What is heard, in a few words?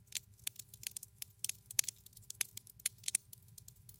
campfire crackle